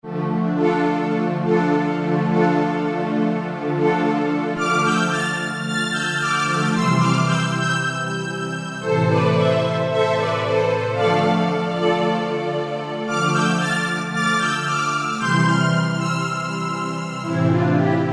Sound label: Yamaha TG33; SP-Omni